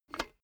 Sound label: cash box metal lid open; maschinen; soundpool